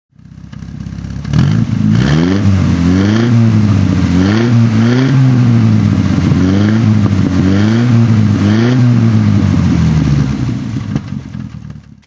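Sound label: facel throttle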